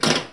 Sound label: Pinball Bumper